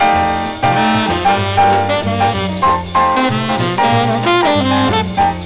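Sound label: bridge, minor-key agressive bossa; first chorus of exchanges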